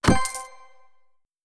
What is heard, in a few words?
item sold